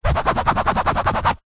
household canvas frame picture large fingernails rub scrape scratch fast; zapsplat